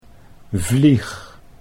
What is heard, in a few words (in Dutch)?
vlieg, duanimals; '(house) fly'; vliegen 1. 'flies' 2. 'to fly'